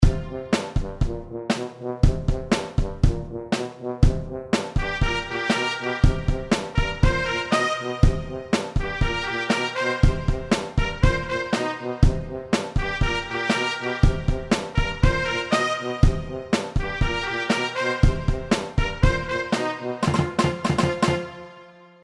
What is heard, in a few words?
funky